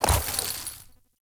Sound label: Horse Brakes Trot; Vehicles; Foley Actions; Warframe; Duviri